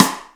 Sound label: Clap